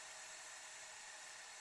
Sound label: steam Loop; eggomatic